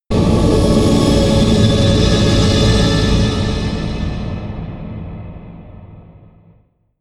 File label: whoosh turbine swish; swooshes-swishes